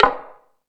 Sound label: House Machine, E-MU Pro-Cussion